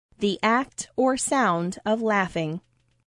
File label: laugh; the act or sound of laughing